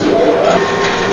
conveyor belt 8Bit; abbottabad compound

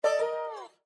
Windows Error

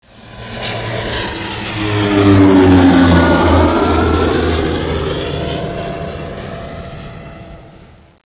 airplane 8Bit; abbottabad compound

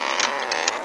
bAMBOO cAGE dOOR 8Bit; abbottabad compound

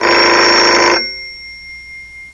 Phone Ring 8Bit; abbottabad compound

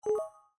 Windows Pop-up Blocked; abbottabad compound

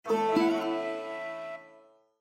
Windows Logon Sound; abbottabad compound

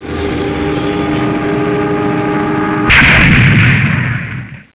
plane Crash 8Bit; abbottabad compound

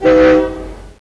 train horn 8Bit; abbottabad compound

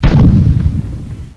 ANTI-AIRCRAFT 8Bit; abbottabad compound

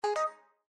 Windows Pop-up Blocked; abbottabad compound

